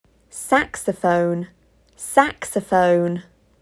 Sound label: Saxophone